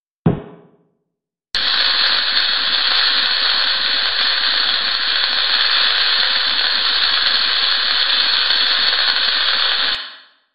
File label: Flare pop sizzle